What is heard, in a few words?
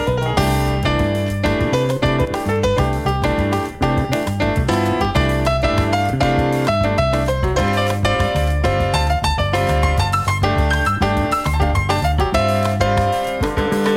Easy Piano